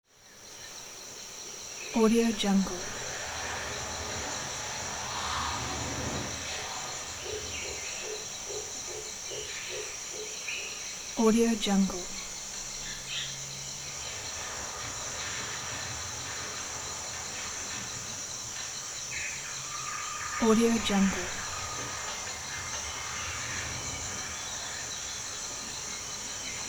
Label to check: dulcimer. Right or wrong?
Wrong